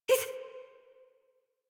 Pain Adult; Warframe; New War; Adult Operator

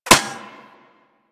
Bullet Impact Metal; Warframe; Weapons

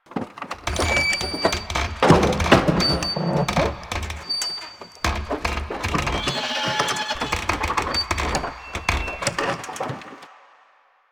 Car Door Slam Slow Loop; Ambience; Props; Warframe; NineteenNinetyNine

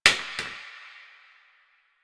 Plastic Broom Drop

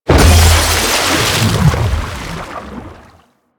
Pilot Breakout Hit Three; Warframe; Amalgam Carrus

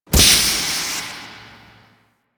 Large Door Steam Burst; Warframe; Ambience; Corpus Gas City; Gameplay Remaster

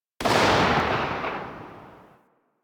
Briefcase Launcher Tail; Warframe; Weapons; Corpus

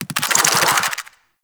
Infested Big Ashen Melee End; Warframe

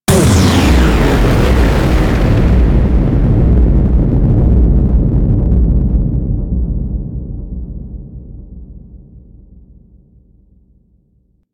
Archwing Giant Space Explosion; Explosions; Warframe

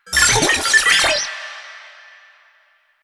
Sniper Drone Vocal; Warframe; Lasrian; Lasrian Drones

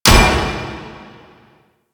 Fist Impact Metal; Enemies; DE; Warframe; Tyl Regor; Fists

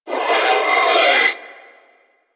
Sentient Symbilyst Death; Enemies; Barks

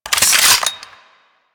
Saw Blade Launcher Reload; Warframe

